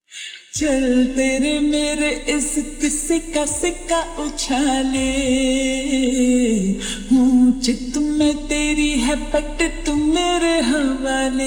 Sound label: Ringtone Slowed Reverb